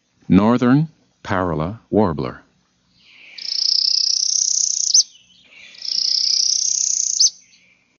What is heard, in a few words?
parula